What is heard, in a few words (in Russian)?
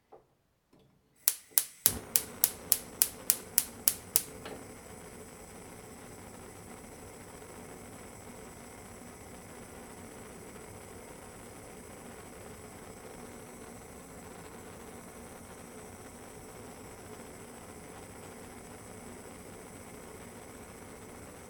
konforka, zvuk gazovoi plity